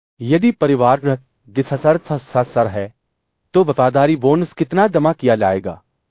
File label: techno